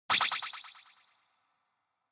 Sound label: Flash High Mid; Onoma; オノマトペ 光る; 高 中